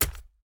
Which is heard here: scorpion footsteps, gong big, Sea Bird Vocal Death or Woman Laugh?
scorpion footsteps